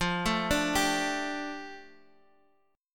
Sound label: F 6th Add 9th F Guitar Half Step Down; chord